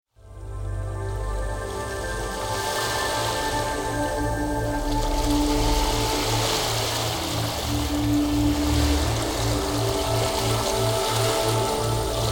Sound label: Isochronic Tones Brainwave Entrainment; Sacral Chakra